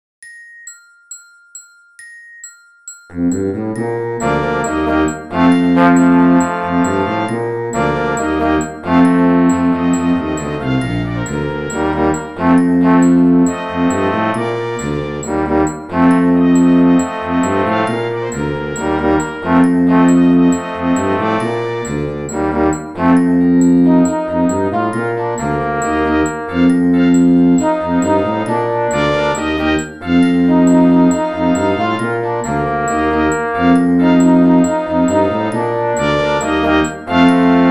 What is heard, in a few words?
all parts with click 136